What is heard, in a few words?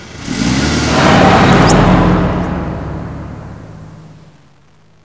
car speed up